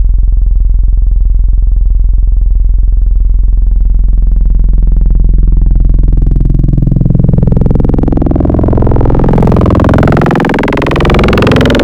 Formant 4th f Mod, Serum Wavetables; Synthetic's Serum Collection; Cymatics - Nuclear for Xfer Serum Wavetables

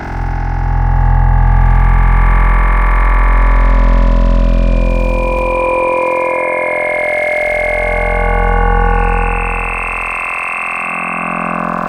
Spectral Over Tones; Wavetables; Synthetic's Serum Collection; ESW Core Wavetables; Echo Sound Works Core Tables

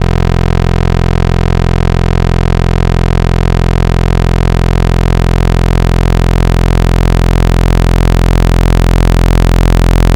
Analog ARP Saw; Synthetic's Serum Collection; Echo Sound Works Core Tables

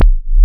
single cycle waveform; Synthetic's Serum Collection; Cymatics - Single Cycle Waveforms for Serum

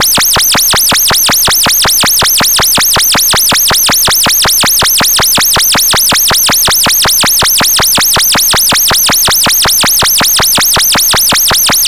recycled sine; Synthetic's Serum Collection; copycat plug

